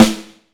Snare Drum; 6.5X14; Q Up Arts; Johnny.C's Vintage Snare Drum

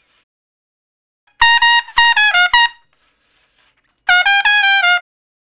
Trumpet Shuffle (Bb); Solos; Crimson Blue and Fabulous Horncraft 4 RnB; Horncraft for R&B

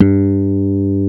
funk soft G2, digital bass; Music Store - Claudius Diemeter Schwerlos

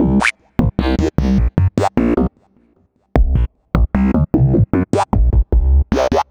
Synth Bass

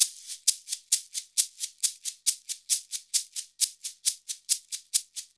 089 FREES, Modern Percussion Loops; USB Soundscan vol.56; AKAI

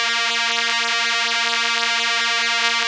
Elka Str A#3, Elka Strings; Keyboards of The 60's and 70's

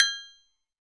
AF AGOG, Agogo; NorthStar - Global Instruments VOL-2

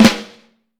flam, Vintage Snare Drum; Q Up Arts - Johnny.C's Vintage Snare Drum

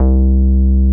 Moog hi C2, analog bass; Music Store - Claudius Diemeter Schwerlos

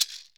MARACAS; Sonic Reality; Interactive Drum Kits

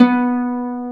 Celtic Harp B2; Multisamples; Digital Sound Factory Planet Earth

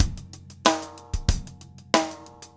16ths, drumscores

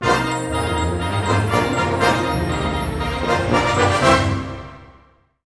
HIGH, ORC Highland; East West - Scoring Tools

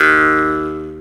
Jaw Harp Uu; Multisamples; Digital Sound Factory Planet Earth

